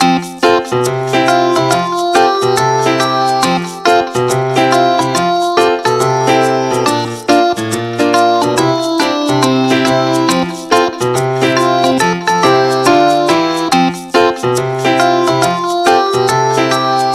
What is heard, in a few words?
Chop Catch Music; Story of Noah's Ark; nds